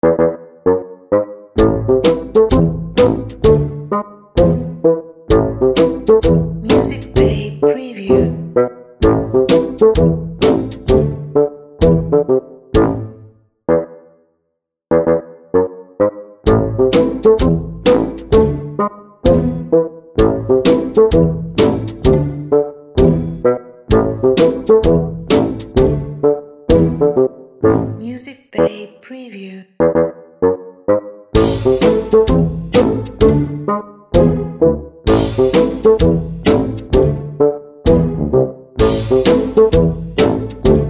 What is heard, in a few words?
Funny Bassoon Comedy Music